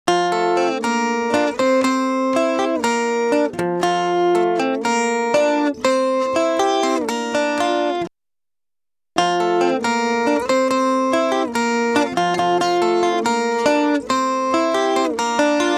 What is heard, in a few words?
Salsa Picante; Masterbits; World Zone VOL-3